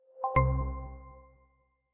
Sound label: setup final, homepod